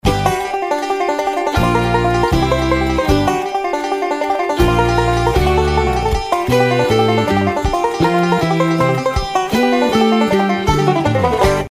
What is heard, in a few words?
country banjo small